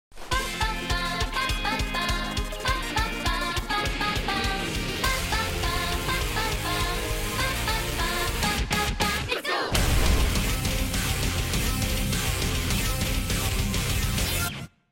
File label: intro background; Unikitty! Sparkle Blaster; NextlevelGames